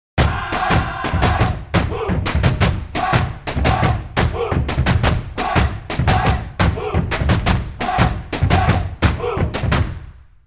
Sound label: Body Percussion